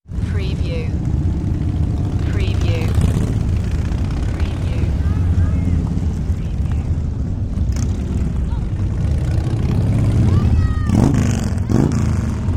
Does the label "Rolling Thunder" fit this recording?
Yes